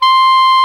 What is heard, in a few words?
SOP SAX C6; Greytsounds VOL-1